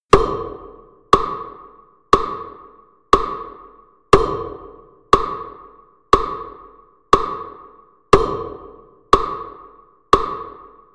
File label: Metronome